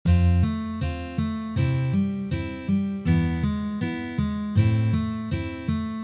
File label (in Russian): perebor na gitare variant dlya pripeva